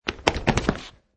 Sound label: Stagger; Footstep - Man wearing jeans and hiking shoes staggers on wooden floor surface - Variation 2; Footsteps; Human